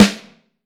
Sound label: CHB, Vintage Snare Drum; Q Up Arts; Johnny.C's Vintage Snare Drum